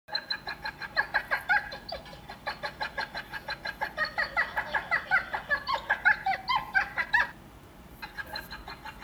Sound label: whimpering